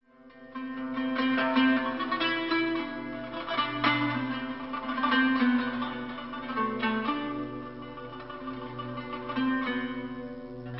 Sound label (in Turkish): santral fon muzigi